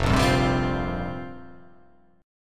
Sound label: F Suspended 2nd Suspended 4th F down